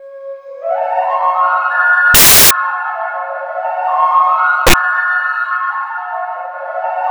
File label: ENIGMATIC; Spectrasonic Distorted Reality 1